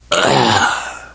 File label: death scream, biker, survivor voice